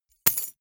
key ring large drop carpet medium

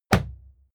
fight impact sweetener punch hard; krieg-waffen; nahkampf; soundpool

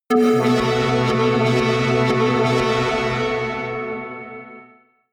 synth hit tube high, Hits/Impacts; whooshes rises

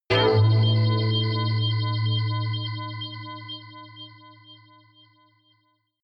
synth hit pulsate, hits impacts; whooshes rises

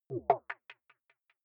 button echo wobble bright; signale

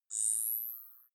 zoom in light

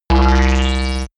intruder alarm; energie

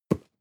cardboard box medium grab hard; objekte

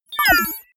confirm sweep, signale; soundpool

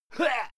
male fight attack hit; schreie; menschen; soundpool

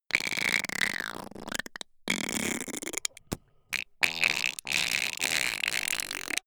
creature quack long; kreaturen

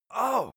male hit soft

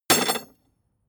metal tools drop; berufe; handwerker; soundpool

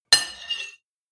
cutlery on plate cut squeak short; berufe; soundpool

